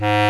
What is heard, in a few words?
WOODWINDS